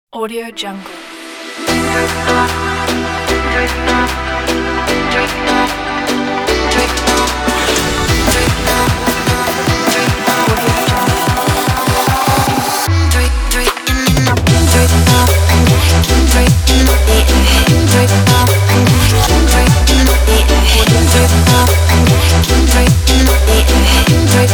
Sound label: future bass